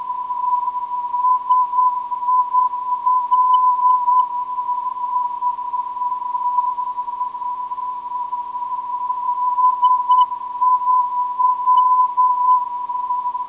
489kHz, ndb; SIL

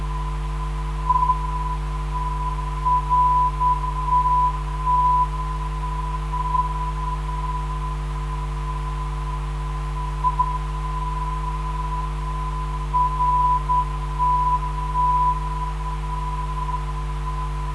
303kHz, ndb

